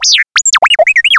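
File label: R2 droid warn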